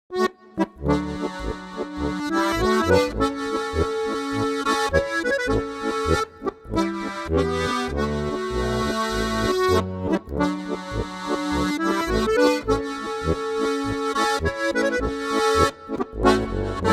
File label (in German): Böhmische Polka